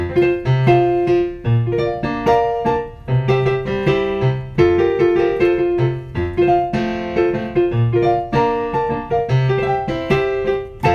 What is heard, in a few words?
judah side accompaniment